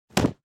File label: Thump